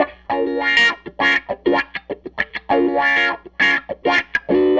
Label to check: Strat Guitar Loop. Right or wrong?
Right